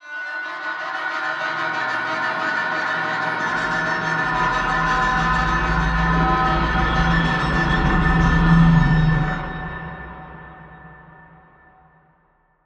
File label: Riser